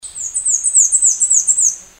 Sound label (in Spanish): Reinita trepadora Mniotilta varia